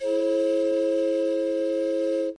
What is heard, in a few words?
boat whistle; Sound FX Real Life